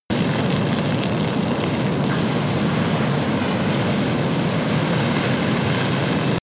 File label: fire object medium burn